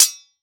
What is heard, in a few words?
Sword Hit Metal, weapons; AdobeCC